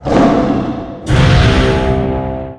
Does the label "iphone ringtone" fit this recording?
No